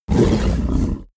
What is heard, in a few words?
fishman snort; kreaturen